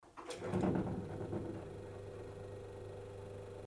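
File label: sound of refrigerator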